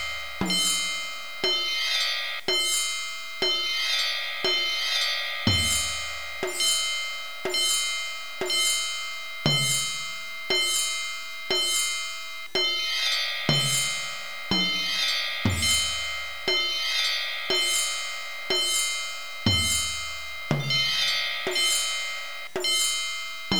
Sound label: orch example